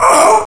human pain

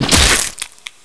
arms grab, zombie

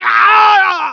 damage

